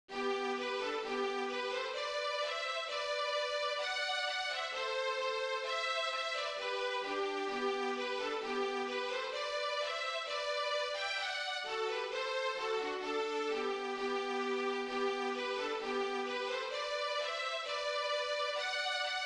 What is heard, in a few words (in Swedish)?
Schottis; efter Brogren